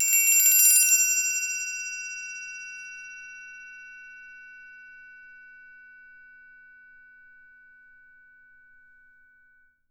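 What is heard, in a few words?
SM TRI left, percussion; Miroslav Vitous - Percussion & Harp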